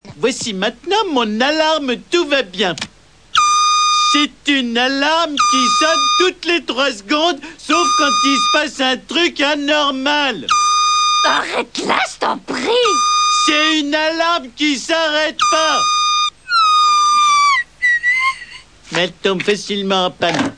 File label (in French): alarme